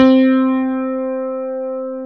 CP70; USB Soundscan vol.09; AKAI; Keyboards Old School

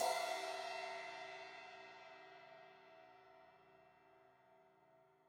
Crash 16in edge, Cymbals; Deeply Sampled Drums

